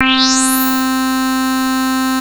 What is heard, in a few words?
Anasound Long hi C, Industrial Analog Single Notes; Sonic Foundry (Sony Creative Software)